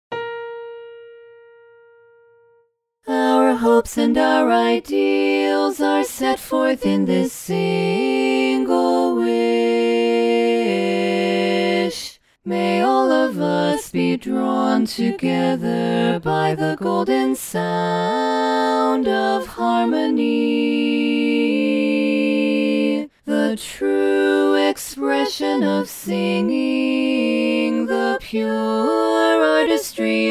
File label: learning track bari missing, chorus